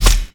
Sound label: Mace Scale, Damage